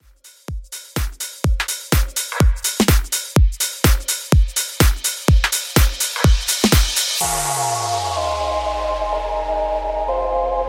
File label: In Outro Club 125 Bpm